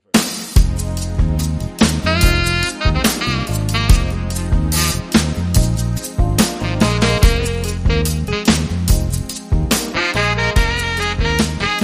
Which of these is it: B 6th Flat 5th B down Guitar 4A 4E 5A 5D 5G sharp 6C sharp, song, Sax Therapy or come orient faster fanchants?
Sax Therapy